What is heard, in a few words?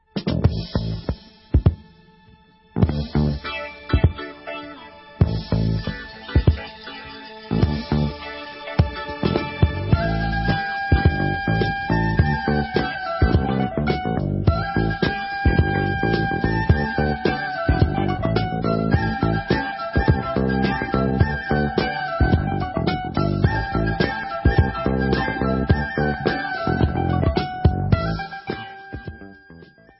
Israeli Disco, Instrumental